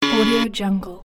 wrong answer

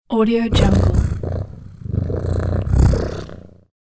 cat purr

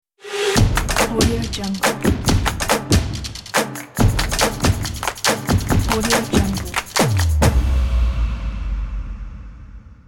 percussive life logo

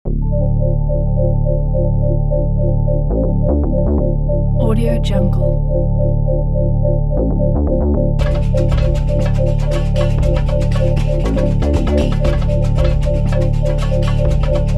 bass trap; marblespace

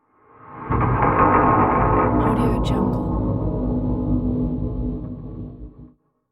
dramatic transition

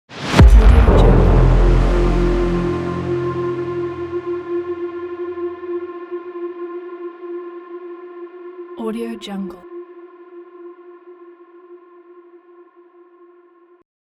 trailer braam; magicsoundeffects

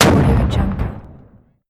shoulderfired rocket launcher firing nearby

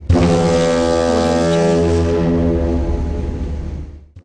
ship horn

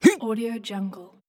cartoon male voice jump

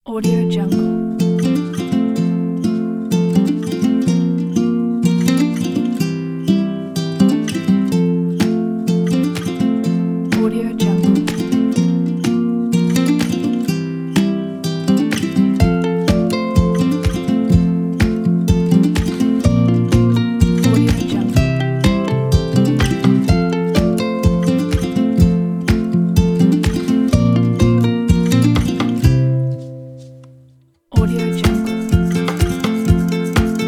cheerful happy

